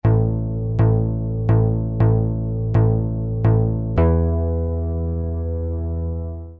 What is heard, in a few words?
jupiter8 bass retrig, Roland Jupiter-8; Product : Jupiter-8 Manufacturer : ROLAND Release date : 1980-1985 Country : Japan